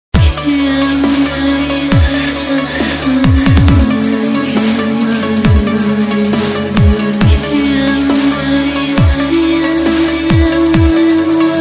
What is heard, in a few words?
Dubstep Mix